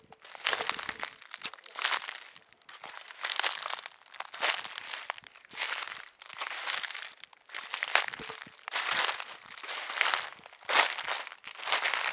Walking On Dry Leaves

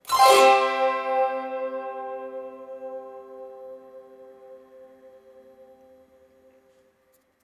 Zither Chord D

